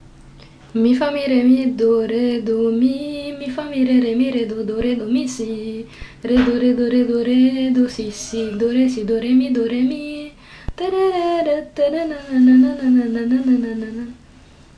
my godawful singing

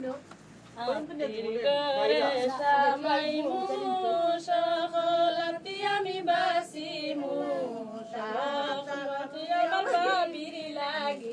Mehndi singing

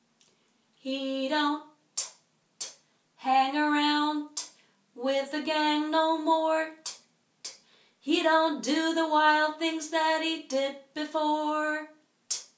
rough idea for percussion

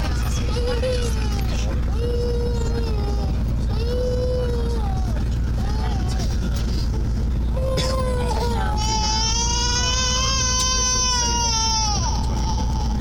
relaxing on the train

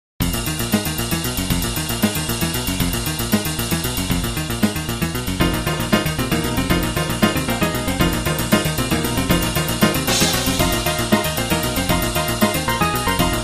virtual hammond b3 organ brass; yamaha cp 70; vst

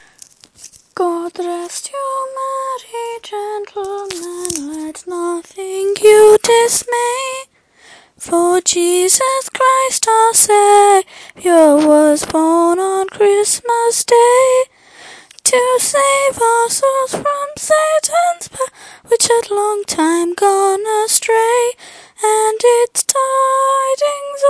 God rest you merry 1st verse chorus

